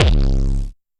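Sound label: TR909 Distorted Bass Drum; Drumset 04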